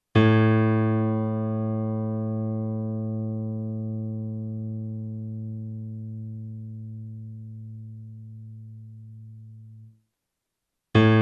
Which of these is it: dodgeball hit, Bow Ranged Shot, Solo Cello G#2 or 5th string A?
5th string A